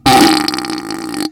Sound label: fart force